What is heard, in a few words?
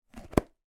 food stackable potato chip tube lid put on; pringles